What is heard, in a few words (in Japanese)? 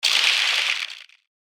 ページめくり